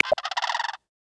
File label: Black Necked Crane; Xiaomi Redmi-Note-8-Pro; South West Mountain